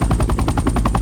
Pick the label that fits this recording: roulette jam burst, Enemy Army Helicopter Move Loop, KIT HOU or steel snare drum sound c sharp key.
Enemy Army Helicopter Move Loop